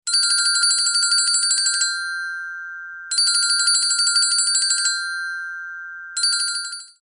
Tinker Bell Alarm

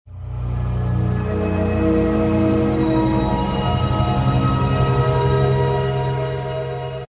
open the box

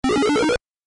power up appears

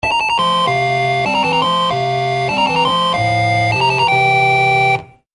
Video Game Game Over; Game Central

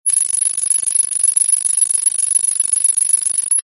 timer electronic insect beeps

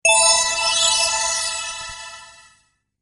Fantasy Alert

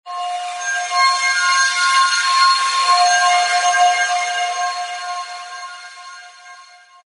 chime ethereal; fantasy

